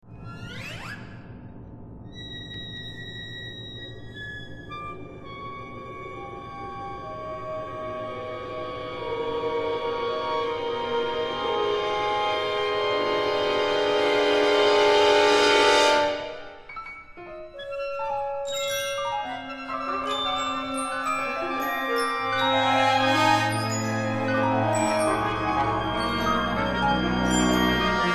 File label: Fireworks